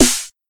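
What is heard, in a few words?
00's Subtle Reverb Steel Snare Drum Sample C# Key, Royality free acoustic snare tuned to the C# note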